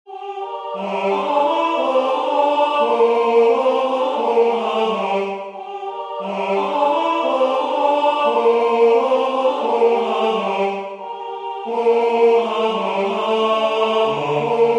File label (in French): baryton; Noel nouvelet Alain